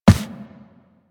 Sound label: Run Default, Footsteps; Warframe; Operator